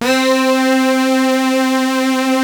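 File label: attack synth C5; ATK SYNTH; Greytsounds; Scott Peers Sound Engineering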